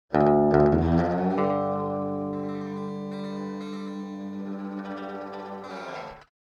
Electric Guitar Twang - Texas Melody, (Slide Guitar) - Clean Guitar; Hollywood Edge; sound designer's toolkit v2